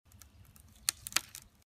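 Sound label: slomali vetki, broken branches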